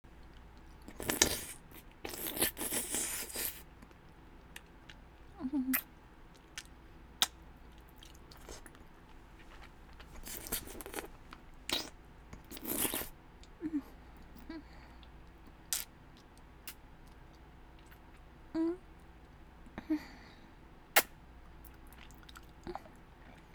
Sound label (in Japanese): フェラ音